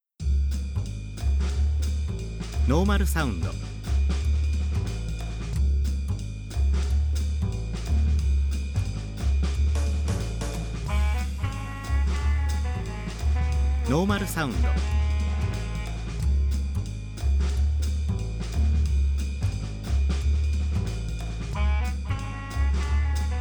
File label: jazz normal